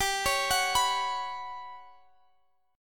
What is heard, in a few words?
G Major 7th Flat 5th G, Guitar Open F; GM7b5 arpeggiated